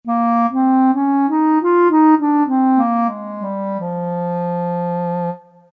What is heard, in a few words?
CLARINET; Roland SN-U110-06